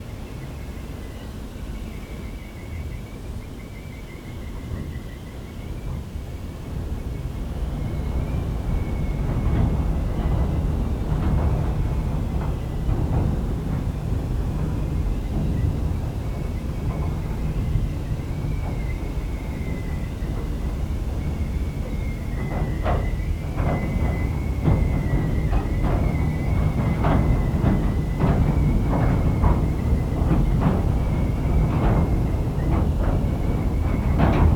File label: Surface Mine, Bucket-Wheel Excavator, Superstructure, Rumble, Squeak, Rattle, Perspective; Coal Mining; toneglowlibraries